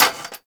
Cafe Chair Short; Ambience; Gameplay; Warframe; Nineteen Ninety Nine

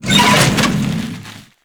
Trashcan; Gameplay; Zariman; Warframe

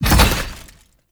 Collision Fast, Motorcycle; Warframe; Vehicles; Motorcycle Ninety Nine

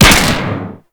Fire 2D Shot, Rifles; Warframe; Weapons; Snipetron Vandal

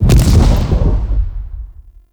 Obelisk Reset

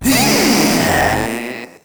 Proto Infested Runner Player Spotted; Warframe

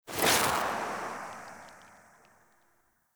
Pause Power Cast, Powers

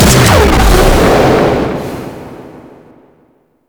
Shot, Weapons; Archwing; Big Boy

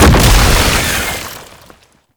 Tank Infested Spire Emerge; Warframe; Lasrian Tank; Abilities

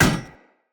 Hammer Impact Metal; Weapon Impacts; Player; Environment

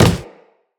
Walk Wood, Footsteps; Operator

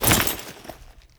Foley Step; Warframe; Kahl

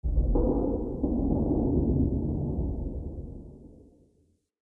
Alley Low Rumble; Ambience; Under City

